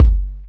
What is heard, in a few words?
kicks; RoBKTA Sample Pack Supreme